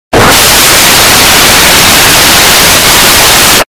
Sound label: loud screaammm